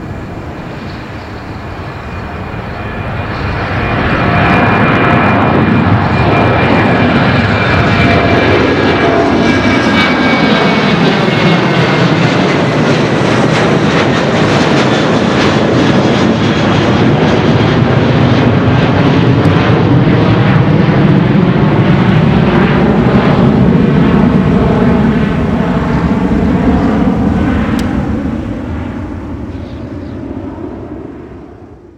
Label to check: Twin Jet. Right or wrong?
Right